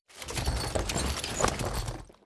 armour

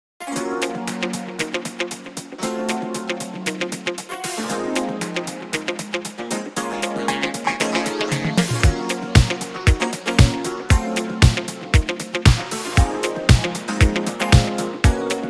city vibe full loop

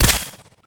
atk arrow

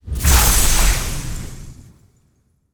card shining

